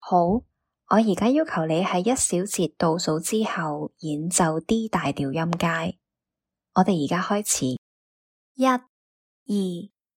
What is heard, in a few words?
D major scale